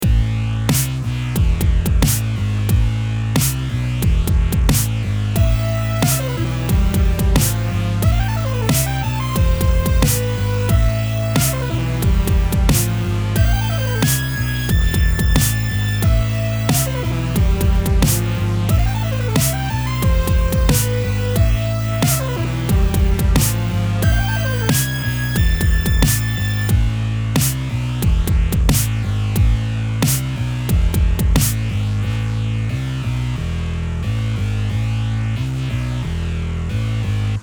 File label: alarm